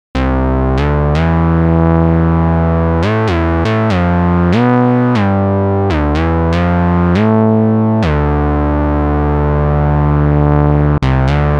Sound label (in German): schön kratzig; bx oberhausen